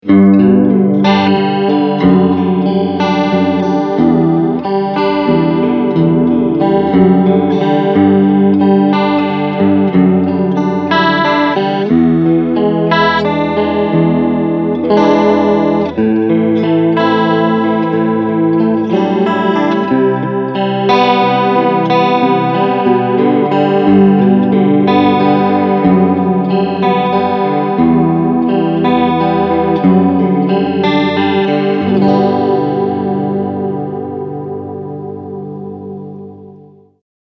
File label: Medium Rate Chorus